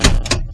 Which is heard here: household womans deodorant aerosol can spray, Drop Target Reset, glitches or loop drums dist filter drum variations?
Drop Target Reset